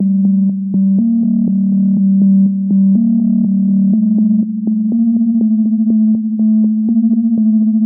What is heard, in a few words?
122 BPM Melodies A maj F min Tonic F; mmb studio soundtrack essentials